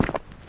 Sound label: footsteps; smite; chaac